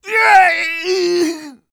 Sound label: male damage